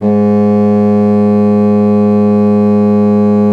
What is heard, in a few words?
bassoon G#2; C BASSOON; Xsample VOL-6